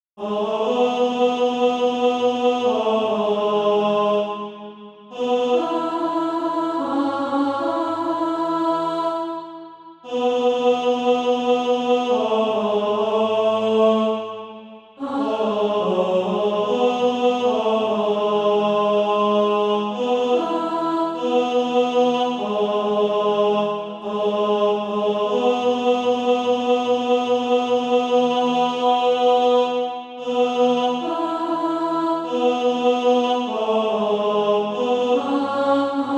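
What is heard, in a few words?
tenor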